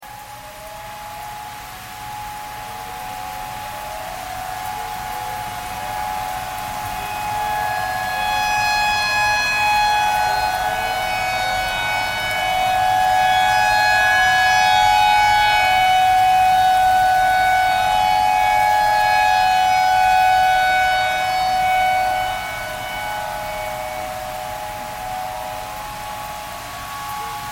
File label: tornado siren tornado siren thread simple